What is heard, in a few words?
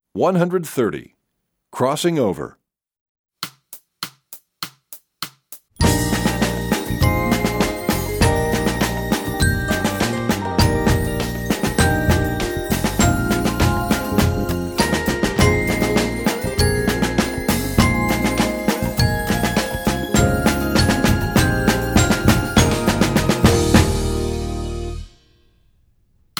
Crossing Over, Percussion; EE2000 Book1